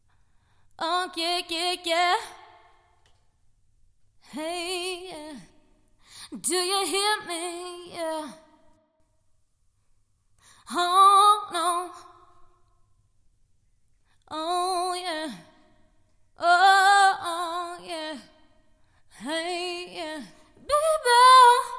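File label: pop rnb 80 F major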